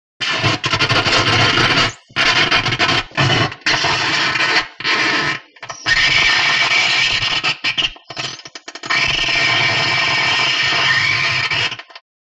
Arc Hiss Sizzle Arc; arcs & sparks

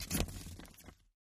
paper page turn; paper mvmt

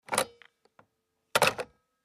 Tel Hang Up Rotary

